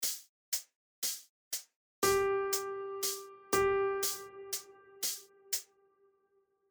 Noire pointée Croches Binaire

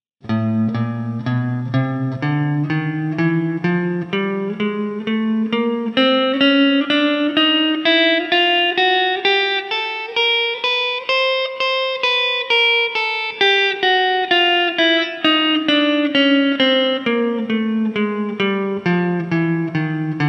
Exercice Déliateur Doigts

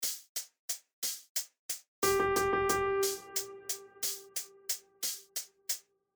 4 Double croches Croche Ternaire

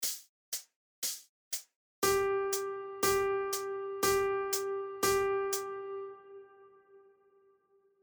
Noires Binaire

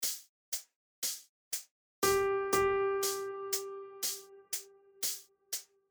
Croche Noire pointée Binaire

